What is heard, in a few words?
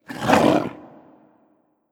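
Skeleton Dog Bite Growl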